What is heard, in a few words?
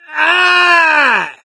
death scream, gambler, survivor voice